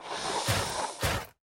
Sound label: CROOK PEACE WALK